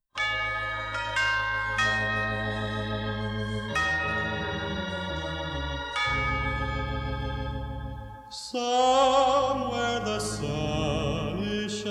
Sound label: Strings Orchestra Choir